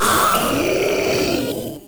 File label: Proto Infested Ancient Vocal Pain; Warframe